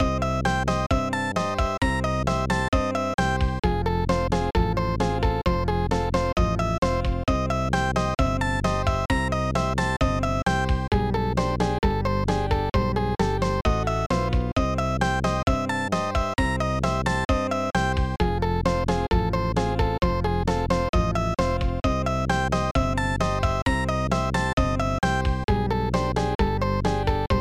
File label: Round Music Faster; pipe dream snes